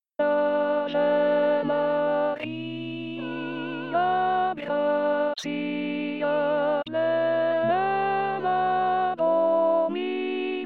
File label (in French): Voix Alto; Ave Maria Javier Busto